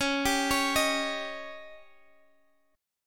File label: Db Suspended 2nd Flat 5th, Guitar, Half Step Down